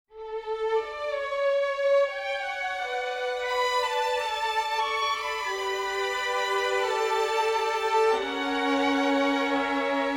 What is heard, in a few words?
90 D maj full mix, Orchestral arrangements